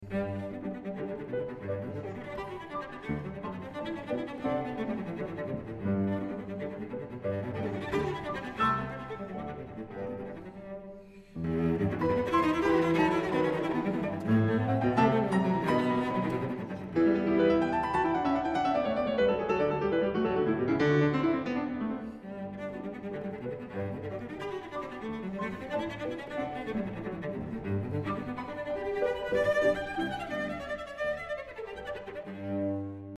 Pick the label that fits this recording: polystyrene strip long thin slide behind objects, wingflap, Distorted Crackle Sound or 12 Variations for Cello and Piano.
12 Variations for Cello and Piano